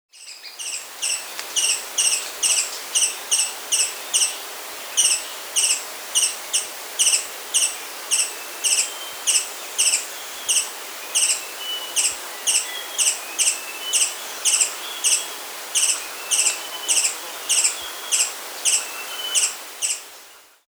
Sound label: Booted Racquettail, Ocreatus, Nature's Songs, S. America; Ocreatus Underwo